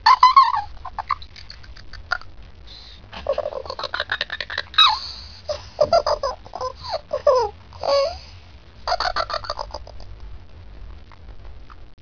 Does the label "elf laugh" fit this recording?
Yes